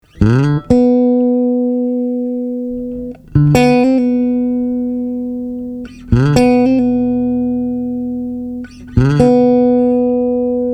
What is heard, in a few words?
BLUES MELODY